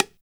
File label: LT BGO TIP, BONGOS; Drums In Your FACE!